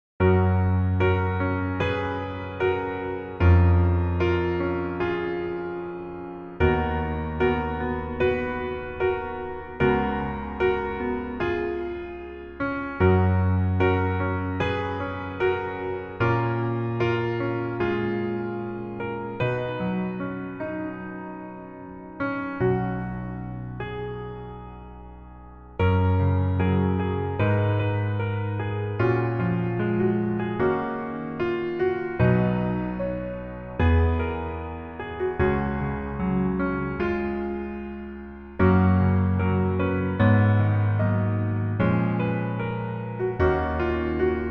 Piano 멜로디 MR -1키

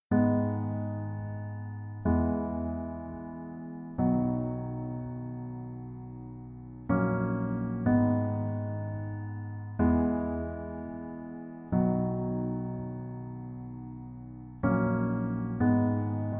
Piano ver 멜로디MR

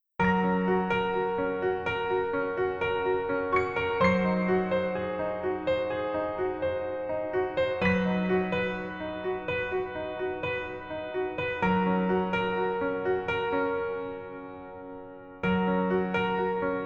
Piano ver 여자키

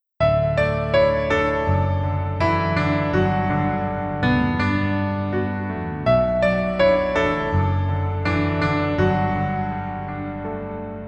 Piano ver, 멜로디MR, -1키

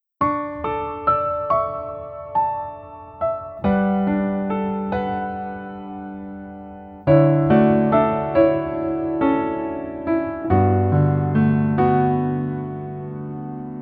Piano ver 멜로디MR

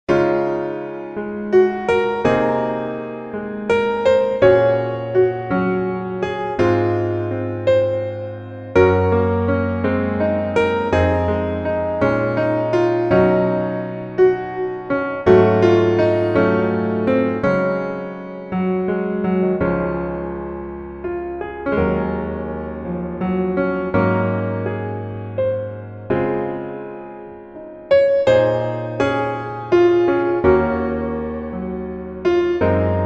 Piano MR 멜로디MR -1키

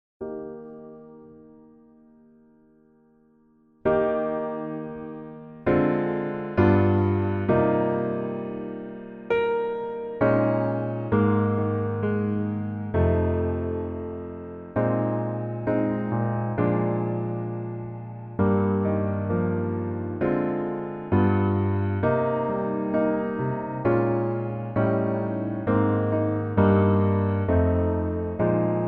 Piano MR -1 키

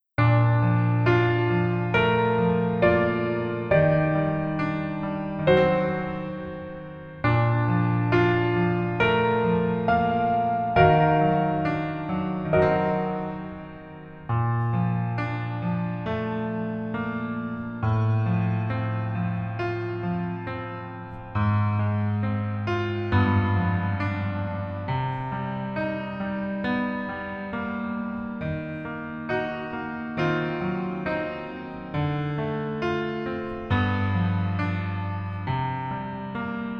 Piano ver -1키

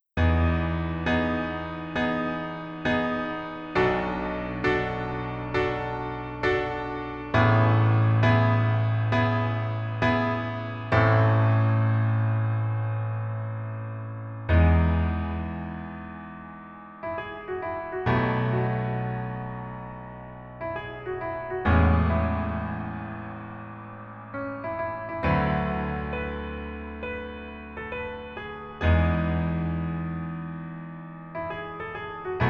Piano ver 멜로디MR

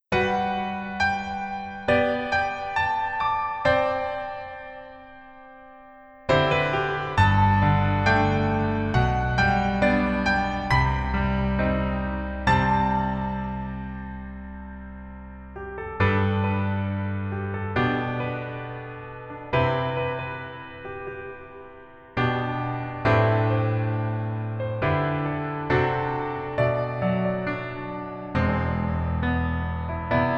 Piano ver, 멜로디MR, -1키; 하이바이, 마마

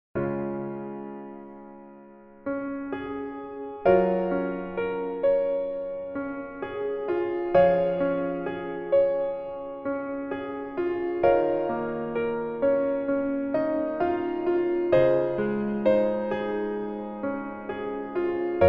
Piano ver 멜로디MR

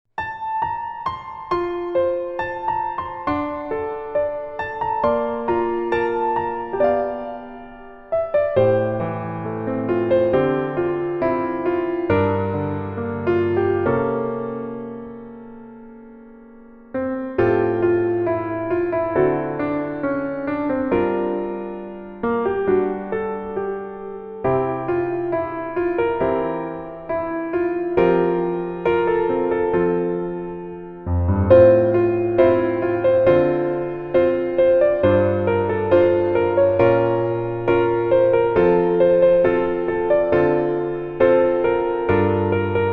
Piano ver, 멜로디MR

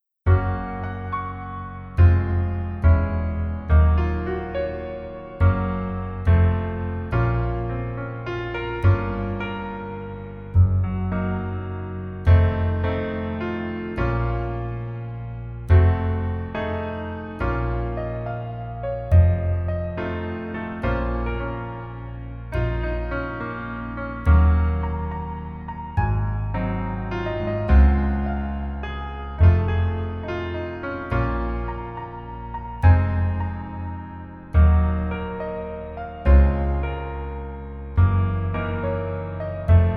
Piano ver 멜로디 MR -1 키

